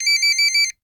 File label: Cell Phone Ring; Communications; 1001 Sound Effects